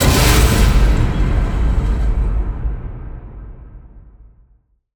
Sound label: cinematic metal impact; trailer; Bluezone Corporation